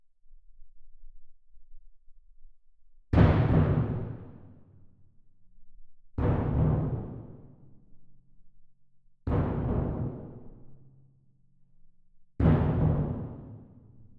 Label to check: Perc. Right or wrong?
Right